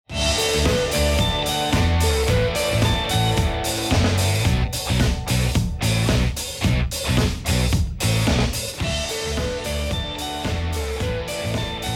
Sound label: start action; music kits custom